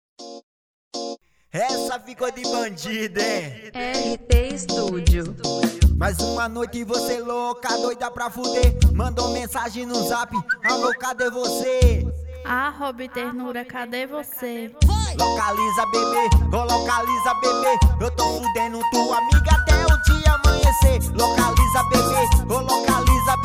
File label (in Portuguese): brega funk; bb lancamentos